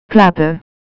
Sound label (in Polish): klapy